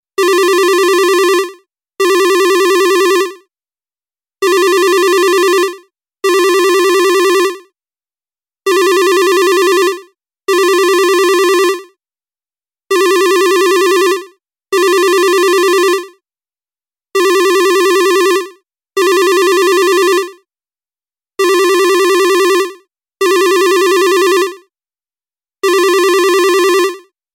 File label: Different old phone